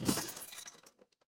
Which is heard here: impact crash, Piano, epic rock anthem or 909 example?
impact crash